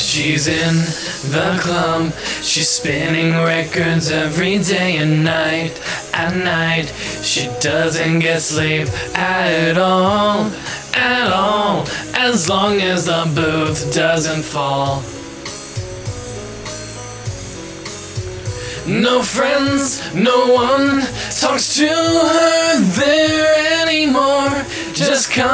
Vocals Only

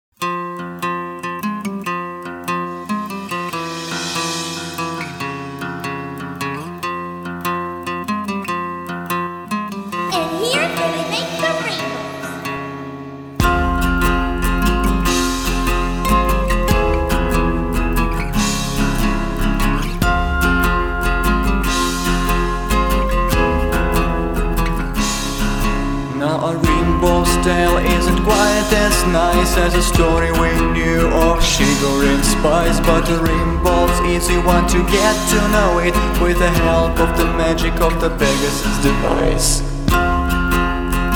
acoustic remix

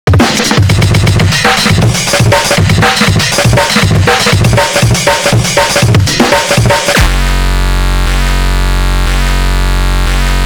drums; Pony Music Archive

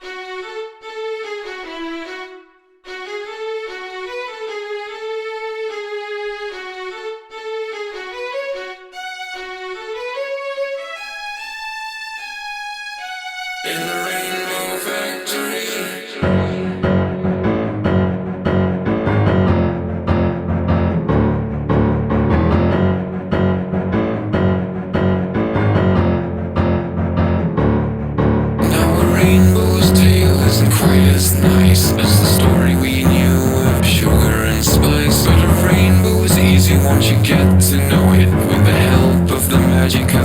Remix, Orchestral Alternative

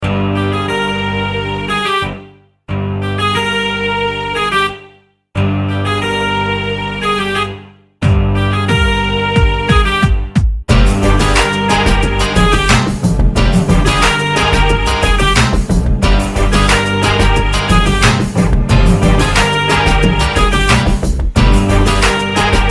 Rap Instrumental

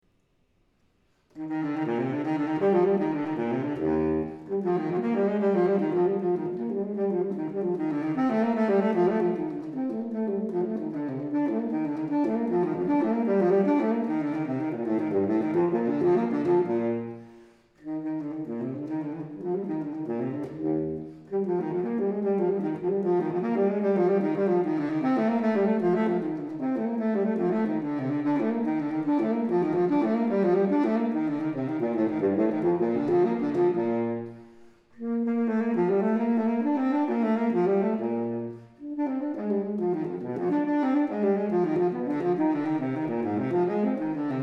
cello suite no 4 in e flat major gigue; bwv 1010

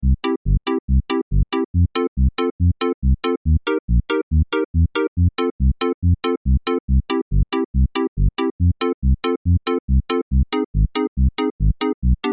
background music; Double Rainboom promo 04

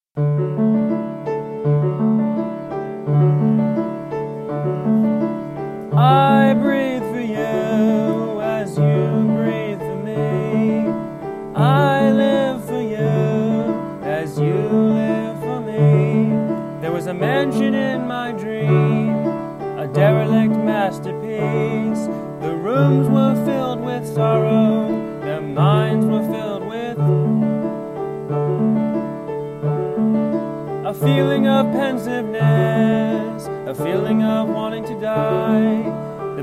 d major dream in sequence

